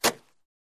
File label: Cardboard Tear